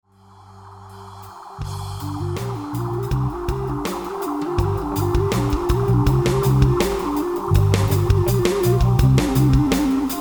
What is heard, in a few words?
dub; MoodDocs